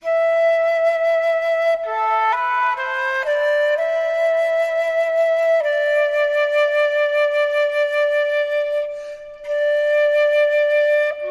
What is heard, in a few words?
Flute Only